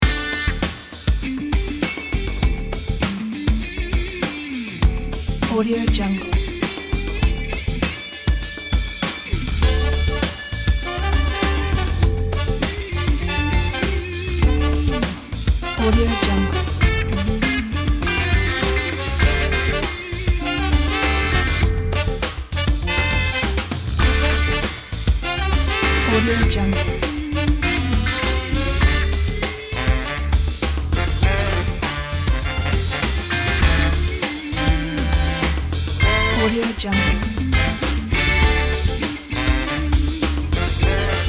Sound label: cool jazz